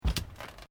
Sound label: Footsteps Boots Plate Metal Step